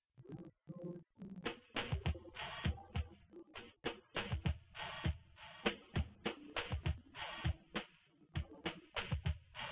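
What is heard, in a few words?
with drums instantaneous source; SiSEC08; SiSEC underdetermined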